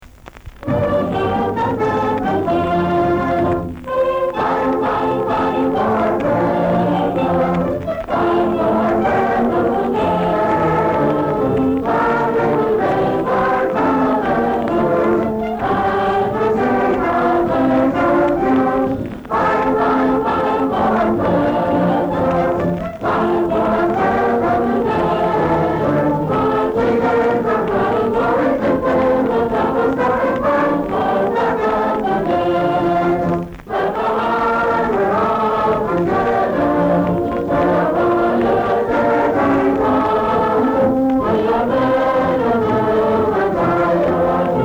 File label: warrior fight song